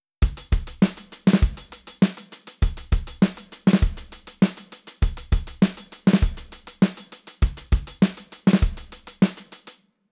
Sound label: drum